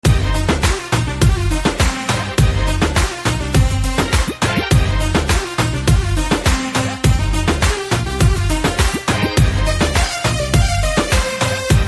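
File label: background music Ringtone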